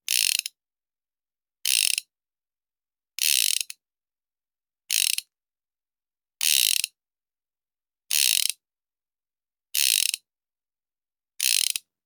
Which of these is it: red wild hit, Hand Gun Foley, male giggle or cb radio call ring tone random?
Hand Gun Foley